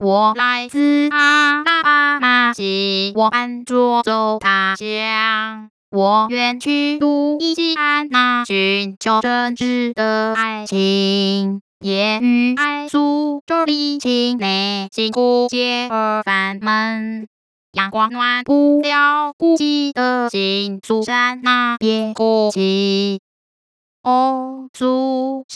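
Synthetic singing voice (female), Pitch coartcltn, Chinese; [我來] [自阿拉] [巴馬], [攜我] 班 左 走 他 鄉; [我遠] [去路易] [西安那], 尋 求 真 摯 [的愛] 情; Mandarin Singing Voice; O! Susanna; Pitch Coarticulation for Mandarin Singing Voice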